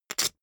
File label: poker chip stack big rattle short